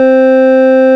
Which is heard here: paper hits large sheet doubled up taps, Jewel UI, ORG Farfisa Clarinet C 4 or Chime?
ORG Farfisa Clarinet C 4